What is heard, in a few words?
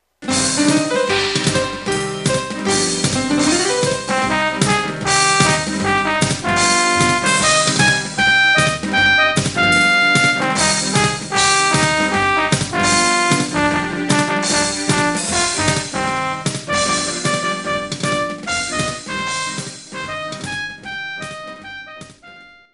jazz